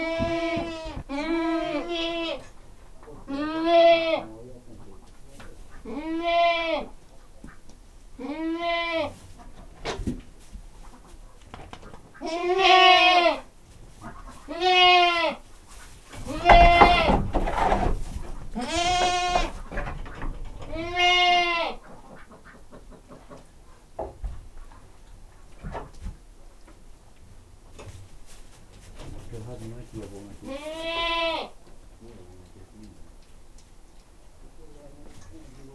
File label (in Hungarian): kecske olbabeszuremlobeszed, hazikecske; parasztudvar